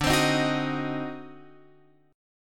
D 7th Flat 9th D down Guitar Open F; D7b9; strummed

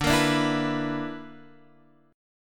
B Minor Major 7th Double Flat 5th, chord

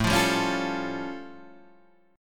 A Augmented 7th A; A+7 Chord {5 8 5 6 6 5} strummed

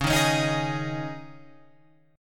Db Suspended 2nd Guitar Open F; strummed; 13,10,13,10,11,10

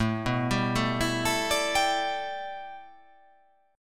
A 7th Suspended 2nd Suspended 4th

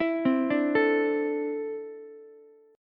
D 7th Suspended 2nd down Ukulele; D7sus2 arpeggiated

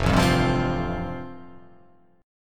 F Major Add 9th; Fadd9 strummed; JGuitar

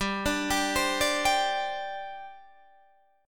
G Suspended 4th, Guitar, Half Step Down

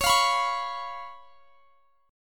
C sharp 7th; C#7; strummed

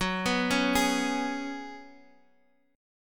Gb Suspended 2nd Suspended 4th, Guitar, Standard; arpeggiated; x,9,9,6,9,x

